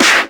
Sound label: Claps & Snares